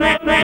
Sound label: CROON HORN; Spectrasonic Distorted Reality 1